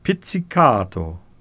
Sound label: Pizzic